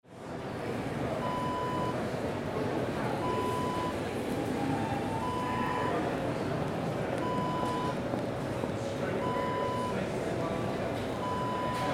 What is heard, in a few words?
marketplace hall ambience